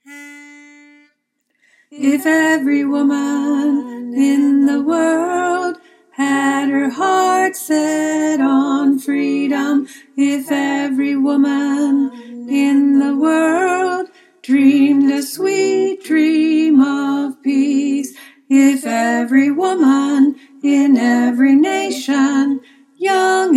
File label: Alto, choir